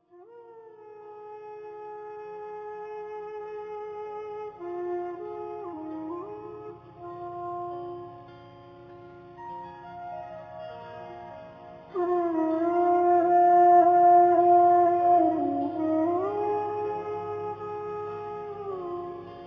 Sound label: Midnight Melody Raga malkaunsa